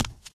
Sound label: concrete, footsteps walk; player; source engine; left 4 dead 2; boomer